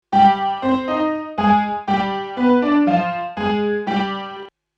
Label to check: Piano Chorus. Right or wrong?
Right